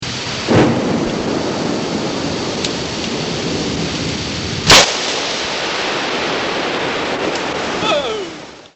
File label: thunder, close